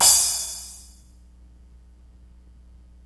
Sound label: Crash, Cymbals